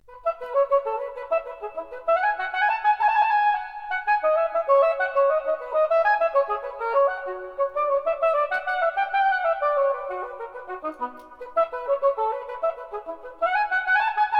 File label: gigue, oboe; Suite in F major for unaccompanied oboe after BWV 1007; Johann Sebstian Bach; JS Bach - Transcriptions for Oboe